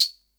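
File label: SR95 tambourine; Best Service; Dance Mega Drums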